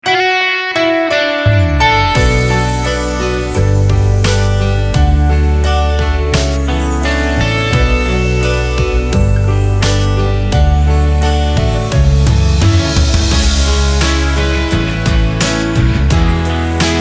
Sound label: Slow 086bpm B Ramp, jingle; Warm 98